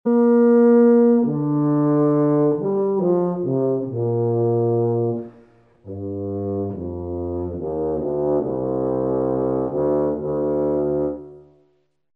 tuba

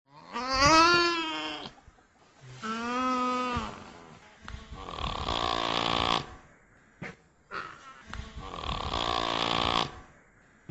nutria; haus und nutztiere erkennen

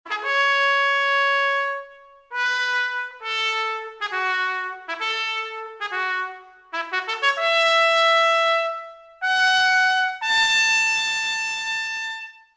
trompete

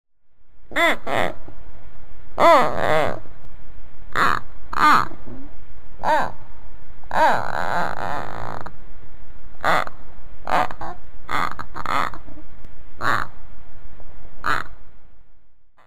hamster; haus und nutztiere erkennen

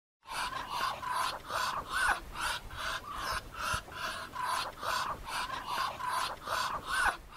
moschusente; haus und nutztiere erkennen

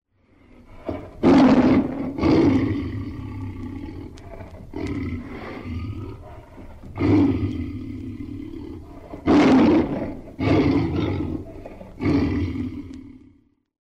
tiger; zootiere erkennen

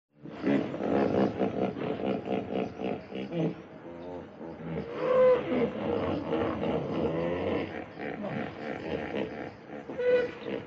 nilpferd; zootiere erkennen

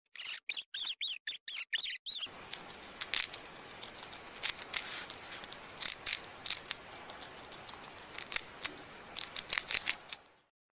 hausmaus; haus und nutztiere erkennen

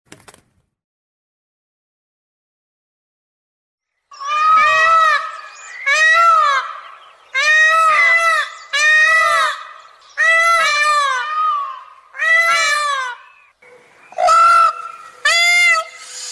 pfau; haus und nutztiere erkennen

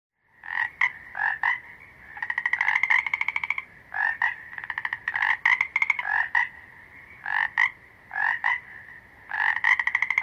frosch; haus und nutztiere erkennen

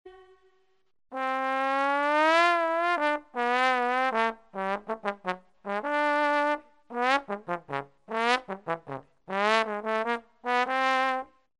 Posaune

